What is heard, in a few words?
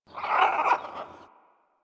Bird Of Prey Vocal Perched Ground B; Warframe